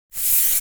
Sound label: Paper slide